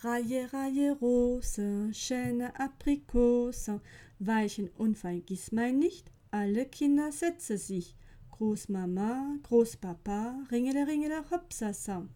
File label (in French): comptines; reihe mothern